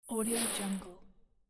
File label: information swoosh